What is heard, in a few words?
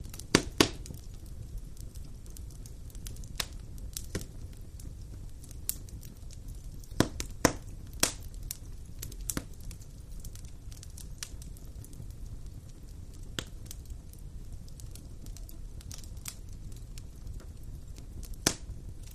Fireplace Crackle